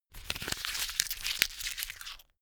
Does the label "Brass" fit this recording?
No